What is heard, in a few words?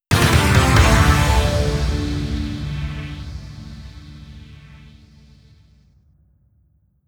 breaking news logo only synth; front-logo; WLS